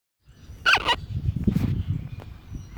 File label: Mobile Tone